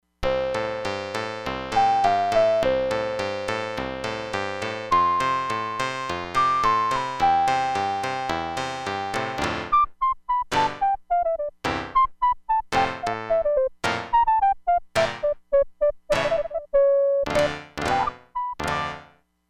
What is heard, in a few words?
CLAVICHORD FLUTE